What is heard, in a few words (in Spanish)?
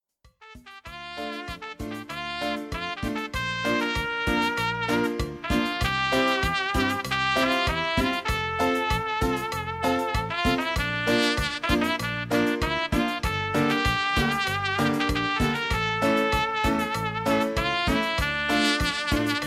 Cumbia